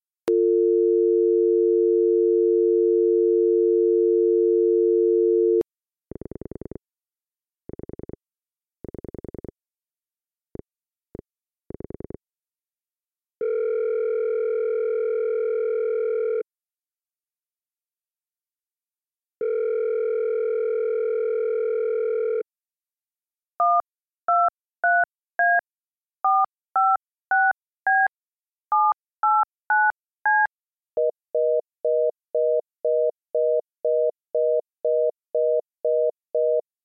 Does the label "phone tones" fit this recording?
Yes